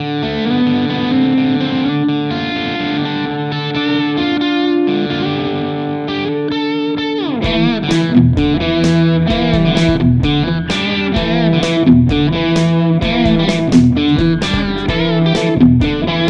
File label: Schecter Sun Valley Shredder Bridge